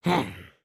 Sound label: Walk; Lego Strombie; build mode